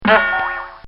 Cartoon Sound Effects Bong; Cartoon Sound Design; Bobby Cole

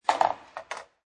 Billiard Pool Snooker Ball Falls In Pocket; Pool ball, snooker ball, billiards ball; Lynne Music

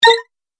Multimedia Button; Business Programs, Apps And Computing Noises

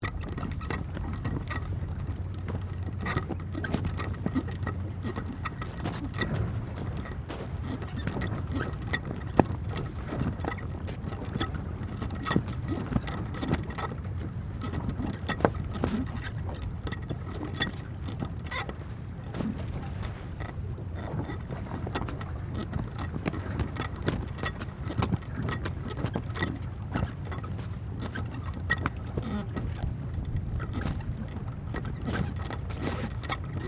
Boat Flag Flap, Creak, Wind, Loop; Transportation; Watercraft; Police, Marine, Division, Cherokee; Blast Wave

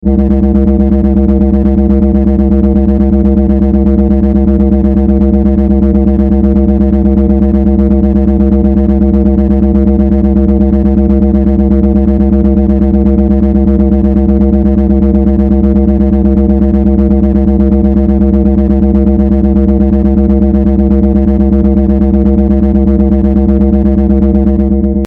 Force, Field, Energy, Source, Scifi

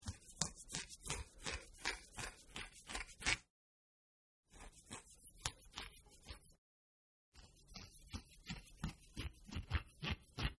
Scratch Finger, Man Scratches His Fingers; 3 Takes; Miscellaneous Human (Sound Effects:Human)

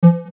Beep Low Pitch Short Soft; Production Element Imaging Element Accent Transition; Multimedia; Beeps

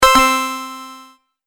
Ta-Da Chiptune; Musical

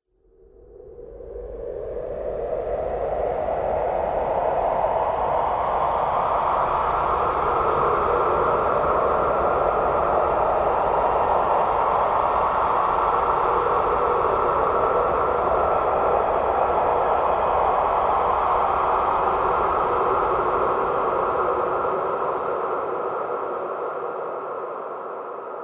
Ultimate Horror Winds; Scary Soundscape

